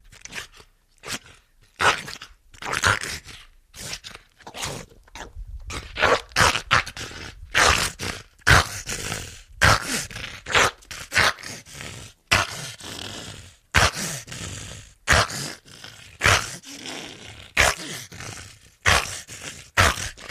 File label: Pit Bull Coughing